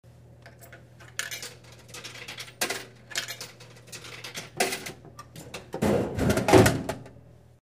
Throwing coins into the machine, falling out of goods; money